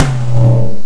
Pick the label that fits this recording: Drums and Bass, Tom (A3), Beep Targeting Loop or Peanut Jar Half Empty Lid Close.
Tom (A3)